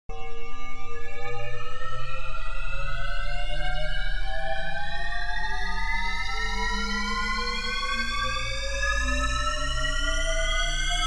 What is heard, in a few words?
Wandering Rising Shepard Ish Tone